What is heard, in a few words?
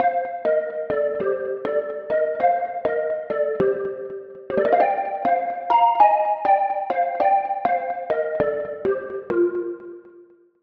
Pluck - Bubble; Bubble Duke; Routed Sound; Vital Expansion - Story Walker Vol.1